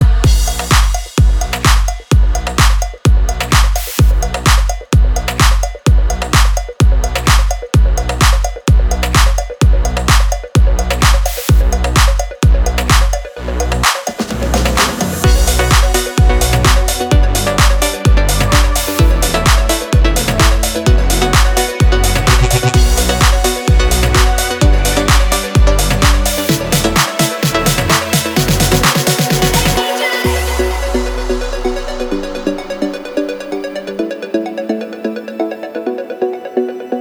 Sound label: Jump Bigroom Remix